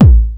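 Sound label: 909 bass drum; 300 Drum Machines; Yamaha Motif ES-Series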